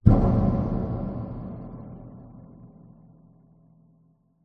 impact sound design wood heavy thump clunk reverb resonant; Skyclad Sound